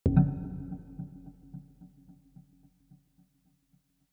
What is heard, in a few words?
Tribal Omni